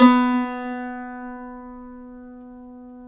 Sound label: SAZ B 3; Spectrasonics; Hans Zimmer Guitars VOL-2